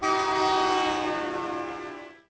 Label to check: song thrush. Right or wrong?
Wrong